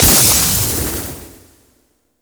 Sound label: Core Reactor Destroy; Warframe; Ambience; Core Rooms